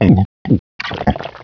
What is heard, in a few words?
drown; Alien Arena; firebrand